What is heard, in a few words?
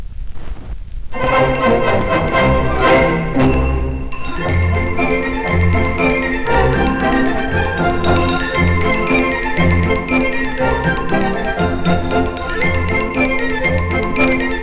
polka